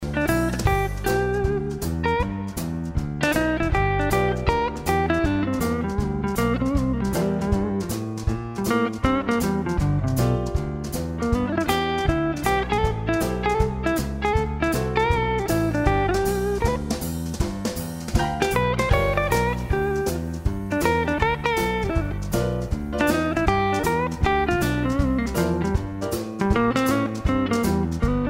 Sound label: table music; twoniuniu